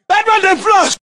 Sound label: charge, Voices Emotes